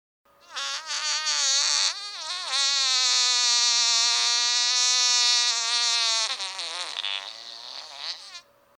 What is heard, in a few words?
brown misquito